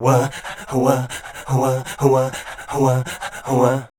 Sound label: scat